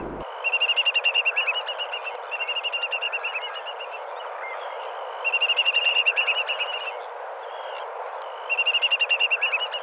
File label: whimbrel